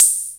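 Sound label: Hi hat, Akai MPC5000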